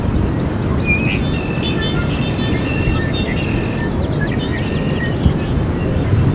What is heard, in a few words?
1 humm